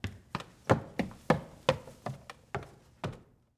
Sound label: Stairs Up Wood; Footsteps; HauntedBloodlines